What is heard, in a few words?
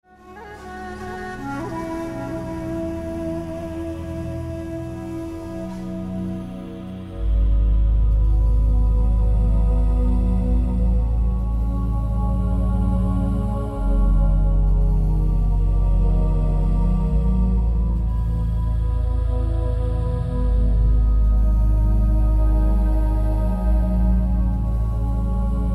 Celtic Dawn